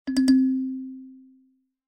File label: sent message failed, phonetones ui